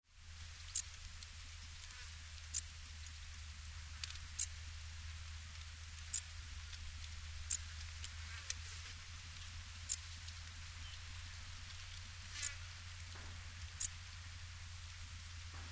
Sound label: dark bush cricket; Rainham -Purfleet NCN Route 13, Essex, England; calling song; 51° 29' 38.05" N 0° 12' 23.76" E; Oscillogram of calling song